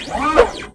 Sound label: ATTACK